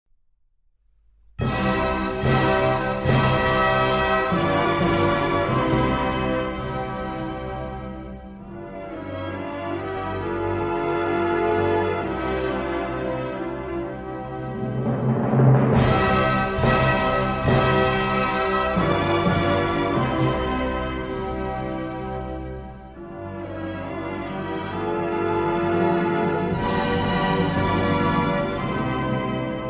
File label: Croatian National Anthem - (Instrumental); Croatia, Republic of